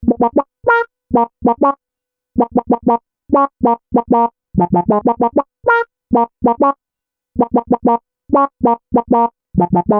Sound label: E-Coast HipHop Quack Synth Dmin; Digidesign; Pro Tools Essential Loops